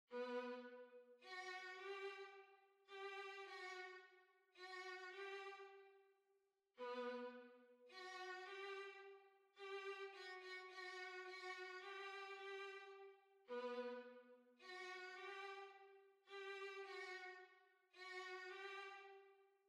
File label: Waltz, Violin; Altenberg Waltz